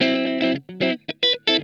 STEAMIN, Funk Guitar; Zero G - Funk Guitar

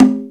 conga; E-MU Formula 4000 Series Vol. 1; Hip Hop Nation; Bonus Perk

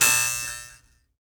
Mbira; Roland LCDP11 Africa VOL-1; M'biras; Bs Mbira 2

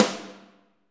SD, Real Kit; 90 s Sample CDs; Sound & Vision - Gigapack I CD 1 (Roland); Real-Kit m15

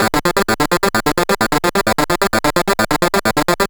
MiniBrute Loop, 130BPM

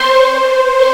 PAD, Akai MPC2500 drum machine

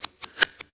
LOOP, 160 BPM; Ueberschall; Drum & Bass Flash Trackz

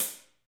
HH, HH Kit St Rev

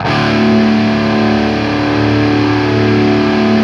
5TH D looped, Guitar; East West; Steve Stevens Guitar Samples Collection

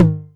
Tom Hi, Novation Drumstation 909

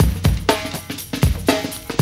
120, LOOP, Roland; Sound & Vision - Gigapack I

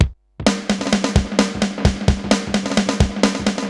Space Echo HR-16B 130BPM

